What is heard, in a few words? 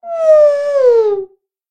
Death Voice; Wendy